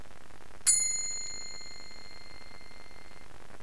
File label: chime